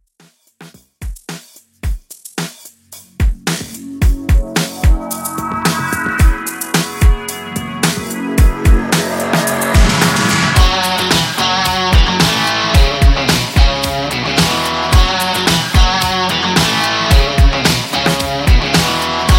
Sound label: 80s Pop Rock Redrum 110Bpm